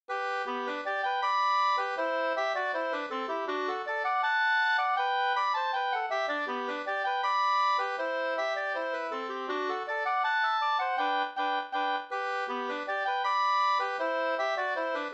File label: polka; fr Leksand 2st